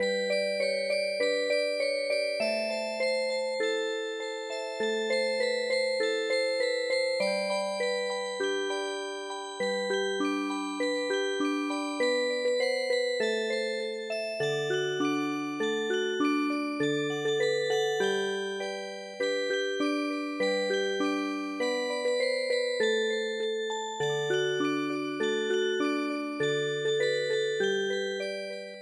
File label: Music Box